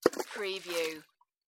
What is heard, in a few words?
Rock splash